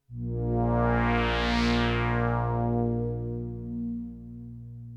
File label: Sweep; opxpro2; Riddle